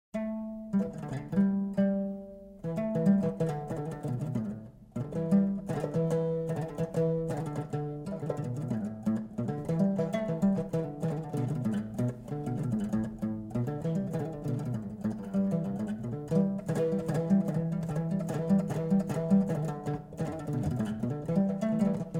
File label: Desert Blues